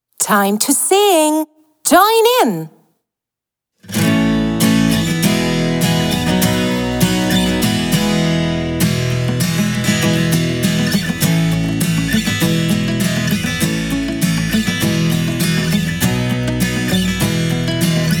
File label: i have got a pet song karaoke; englisch; ich und du; Schuelerzeitschriften